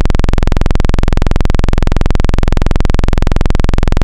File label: Moog saw; Synthetic's Serum Collection; Echo Sound Works - Modular